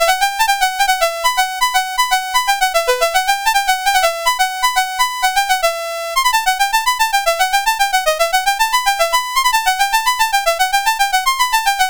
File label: Piano, CSG8580R5